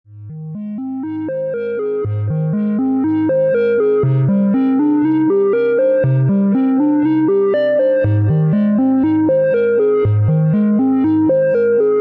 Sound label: Sine Lead